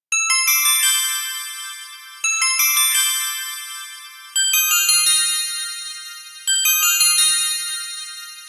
Chime Bell, ringtones; Asus Padfone-2

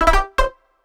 Elliptic alert; PlusFourSix; SE Sound Project; Paul Bergman

